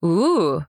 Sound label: "Ooooh"; Devour; Anna